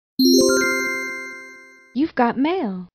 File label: got mail chime